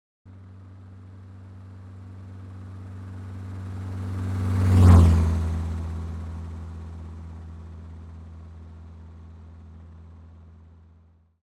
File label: car left to right